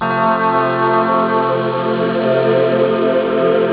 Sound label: choir string; ST-XX Amiga Soundtracker Sample Packs